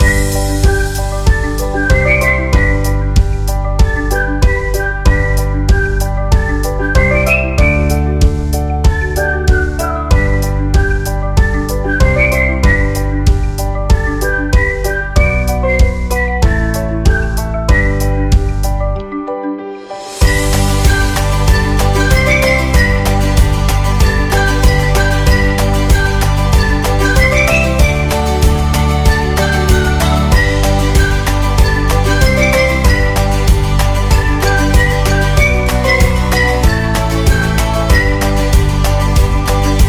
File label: Feel Good; cooking show